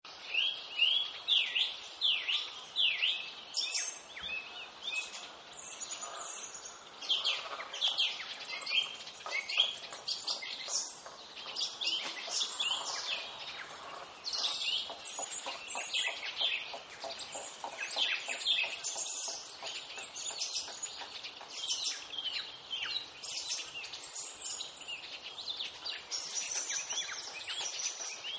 Birds Rural Ambience; Nature Scenes; Animals

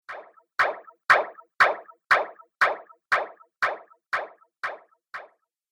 Robotic Banging, sci-fi robot sound effect; Robot Sound Effects; Andrew Fleming

